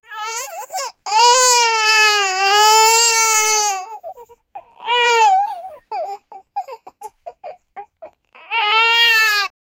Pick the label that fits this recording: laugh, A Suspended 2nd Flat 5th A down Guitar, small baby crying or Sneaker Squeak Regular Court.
small baby crying